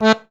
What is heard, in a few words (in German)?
AKK VIOLSK, akkordeon; Best Service; Alpine Volksmusik